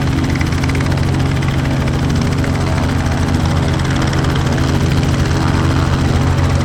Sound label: Idle Loop